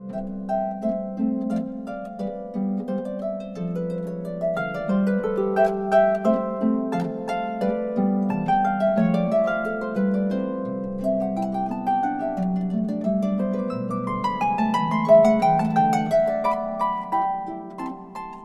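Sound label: Sonate Facile Rondo Allegretto, Harfe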